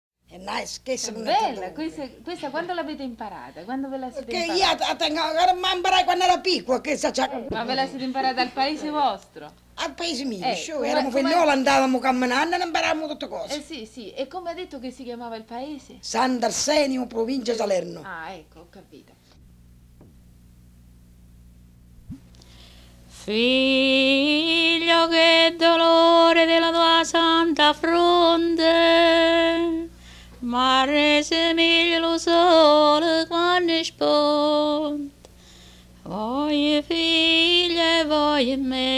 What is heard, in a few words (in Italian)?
Canto della Passione